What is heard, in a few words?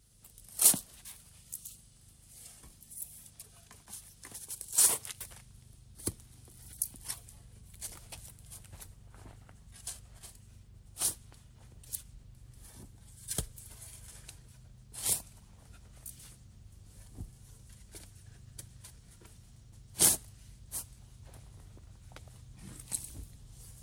Digging In Earth With Spade; Halloween; Halloween Sound Effects - Sounds Of Horror